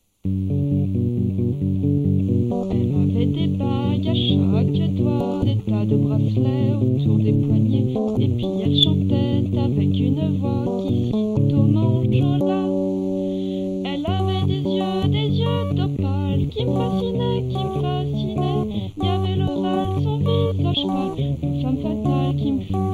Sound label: Singing